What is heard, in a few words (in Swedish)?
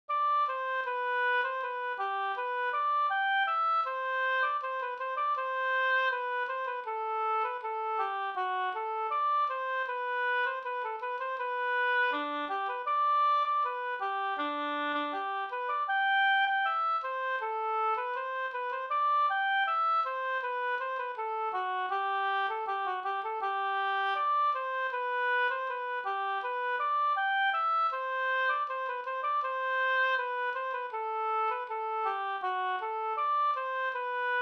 brudmarsch Burtrasks brudmarsch